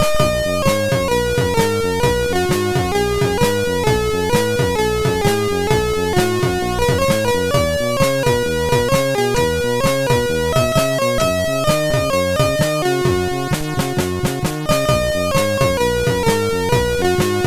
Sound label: sid, MOS6581R2; Unholy 01